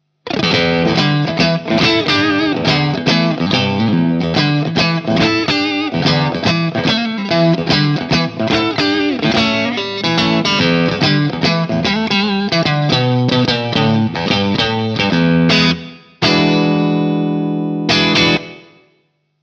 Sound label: hall